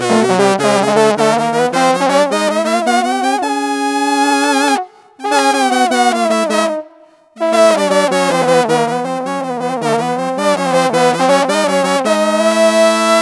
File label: Voice Prophecy Tromb Uni Syn